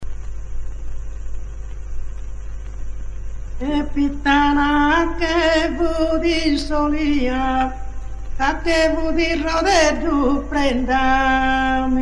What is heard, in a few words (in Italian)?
attitu scano montiferro; canti monodici non accompagnati